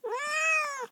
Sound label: Cat groan